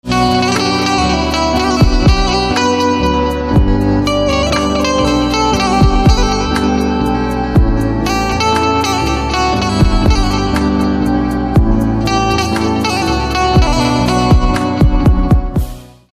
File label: Banjo